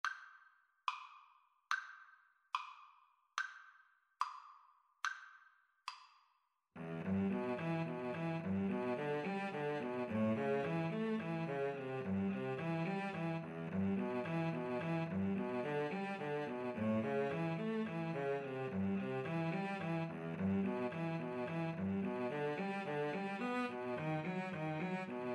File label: house of the rising sun, Violin Cello Duet